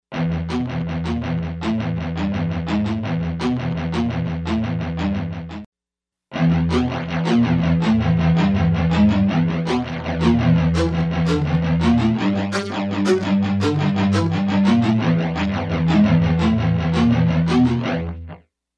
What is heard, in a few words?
Flanger, Lexicon MPX 1; Factory Programs